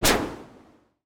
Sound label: retro explosion, synth